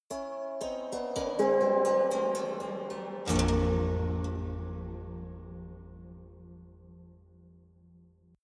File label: haunted strings chromatic descent